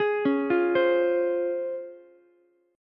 Db Major 7th Db down Ukulele; DbM7 arpeggiated; JGuitar

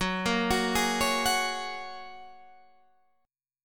F sharp Major Add 9th, Guitar Drop D

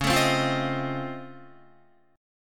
Db Augmented Major 9th Db down Guitar; strummed; JGuitar

